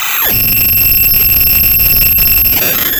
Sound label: Sound of a cat meowing